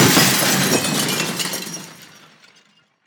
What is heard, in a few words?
Glass Break; Damage